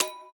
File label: camera video start; Amazon Fire Phone